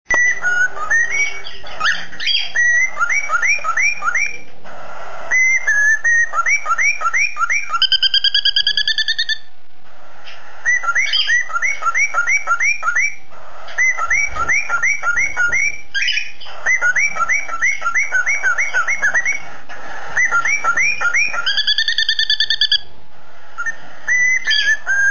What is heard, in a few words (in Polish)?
ŚPIEW PAPUGI